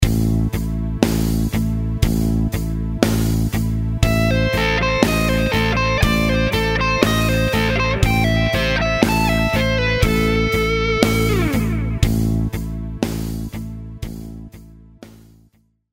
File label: Lick 60bpm